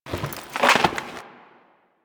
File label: Hunting Bow Load; Warframe